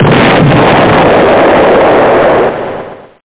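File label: close explosion; rox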